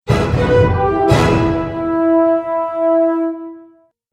Orchestral Transition; Musical

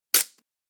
Treasure Coins Drop; Cartoon Sound Design

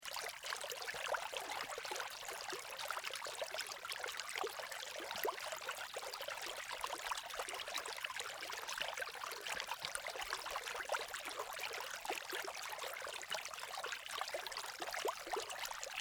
Ambience Creek Small Babbling Brook Loop; Rivers; Nature; SFX Bible

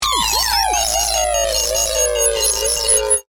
Sweeper, Digital, Descend, Beeps; Sweeper Sound Effects; BlastWave

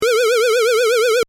Retro ufo loop, SFX: Retro UFO Style Loop; Spacecrafts; Stuart Duffield

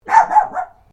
Animal Dog Chihuahua Bark Triple; Various domestic dogs; Dogs (Sound Effects:Animals)

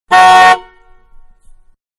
Big Car Horn Medium Exterior; Vehicles; Car Big-Horn-Medium, Exterior; Cars; Manhattan Production Music

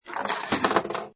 Pinball Sound Ball Rolls And Rattles Objects; Video Game Sound Effects

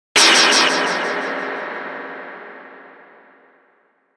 hit airy; Hit Airy Flange Slow Reverb

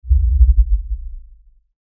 LFE Tremor, Lfe Tremor Low Bass Shake Rumble; Rumbles; Blastwave; Sound Effects:Sound Design

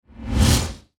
Whoosh Heavy Dark Fast; Blastwave

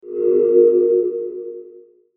Mouseover Soft Synth Swell; Multimedia; Blastwave

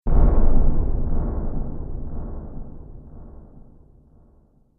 Drum Hit Trailer; Hit Sound Effects

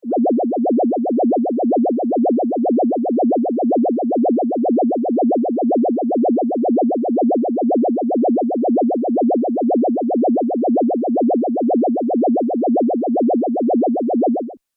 Laser Subtle Pulse Beam

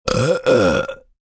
Burp Dry Heave, Burp, dry heave (human voice); Burping; Voice Box

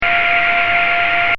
Sci fi machine loop, Scifi Machine: Loop, Short

